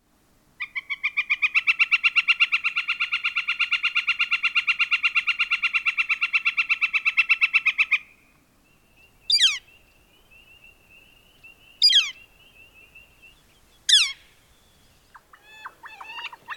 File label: Northern Flicker